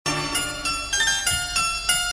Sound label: Message Tone WOW